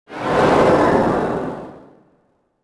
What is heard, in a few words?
absorb, force